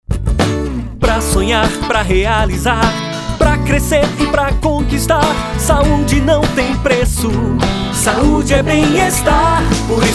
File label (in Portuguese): Jingle; GAMEC; NOVA Assinatura